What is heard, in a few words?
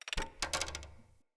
Foley Winch Tension Wobble; Enemy; Nimrod